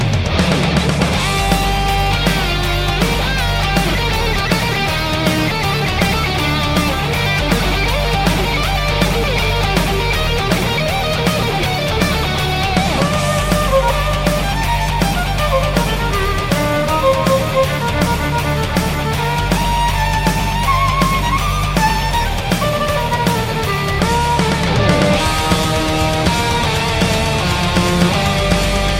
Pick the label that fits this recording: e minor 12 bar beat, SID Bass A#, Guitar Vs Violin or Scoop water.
Guitar Vs Violin